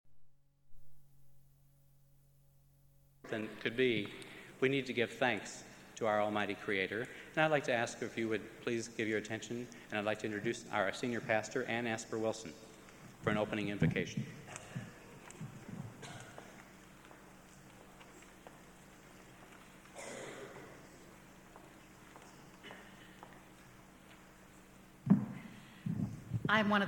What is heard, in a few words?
symphonic choir and orchestra; kiev; ukraine